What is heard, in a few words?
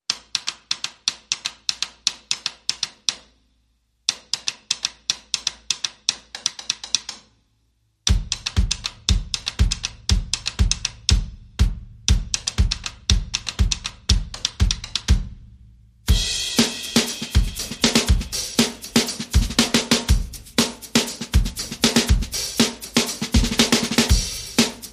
Only Drums